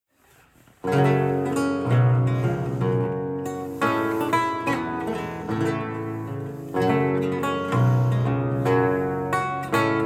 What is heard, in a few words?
Gigue